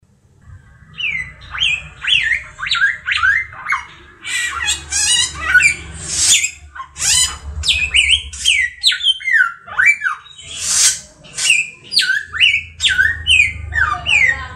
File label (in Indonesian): variasi samyong